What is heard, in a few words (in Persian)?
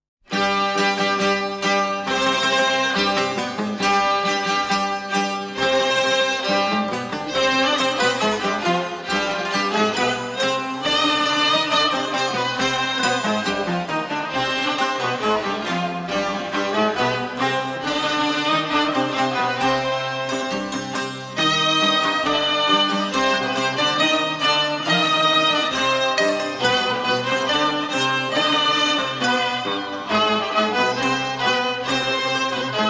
پیش درآمد بیات اصفهان